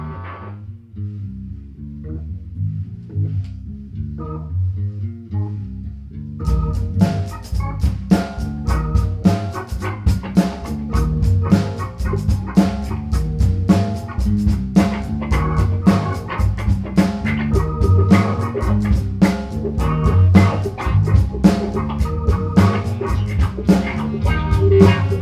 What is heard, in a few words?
funky song